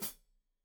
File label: Hat Foot, Hi-Hat, Cymbals Stereo; Deeply Sampled Drums